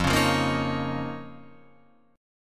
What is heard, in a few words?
F 7th Suspended 4th Sharp 5th F down Guitar Open F; F7sus4#5; strummed; JGuitar